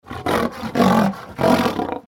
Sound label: roar; افکت صوتی